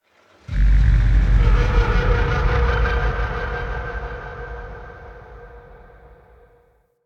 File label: Call Large, Ambience